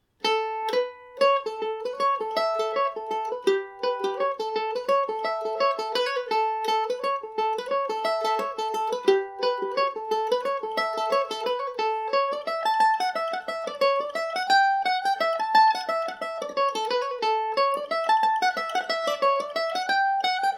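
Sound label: reel A mixolydian, Irish mandolin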